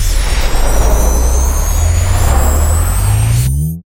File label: near Win Jackpot; godsoffortune